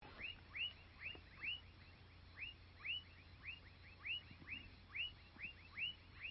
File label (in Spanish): sapos